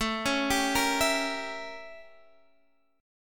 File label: E Diminished 7th A